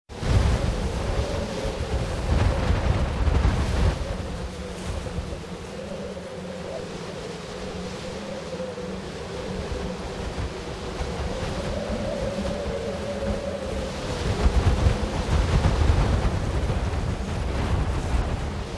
Wind Mountain Blustery and Strong, Good close gusts; background roaring whistle; Wind Sound Effects